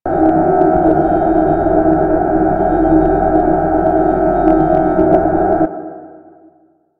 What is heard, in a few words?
Green Console; Objects